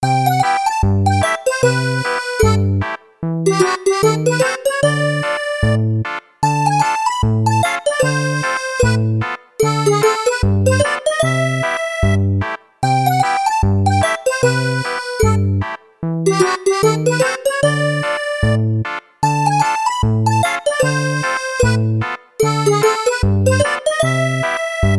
Mini Game Lose; Yoshi's New Island

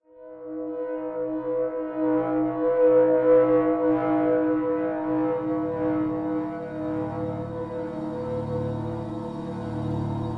Suspence Pad, Low Intensity

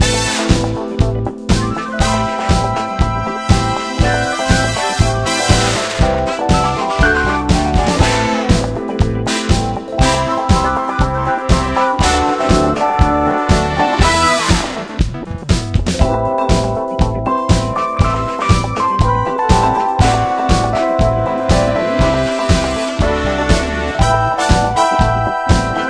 Casino Loop

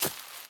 Sand Dig; Blockman Go; Android

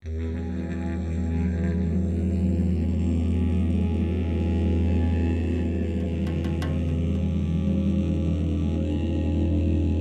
Foresters-Elders' Chant; Rising Kingdoms

